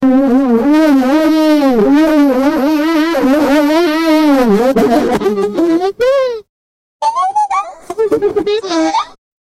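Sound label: pakistani womens laughing, people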